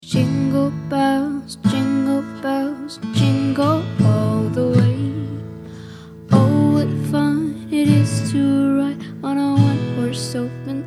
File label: Jingle bells